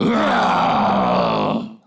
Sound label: Doppelganger Death